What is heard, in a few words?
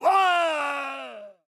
Pain Death